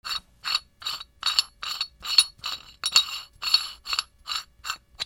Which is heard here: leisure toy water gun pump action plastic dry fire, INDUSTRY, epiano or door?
INDUSTRY